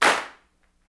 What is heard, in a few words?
CLAPS, Africa Handclaps